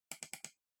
PC Mouse Far L Double

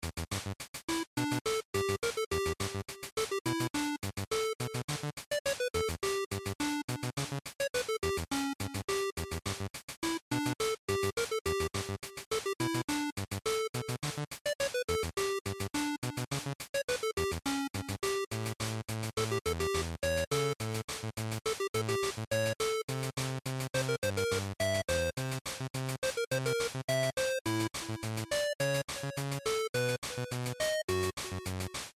Shooter, Stage, Loop

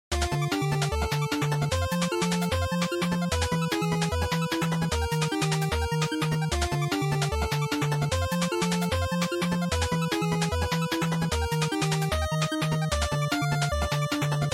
NES Shooter Boss

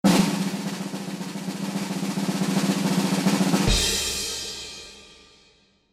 Quiz Results

